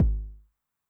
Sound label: BD High Tune Med Decay, 909mod, BASS DRUM; classic machines